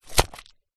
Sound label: rock small down on wet mud squidgy; foley